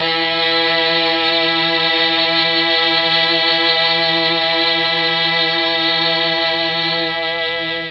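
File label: E2 strings brass, Mellotron; Mike Pinder - Mellotron Archive CD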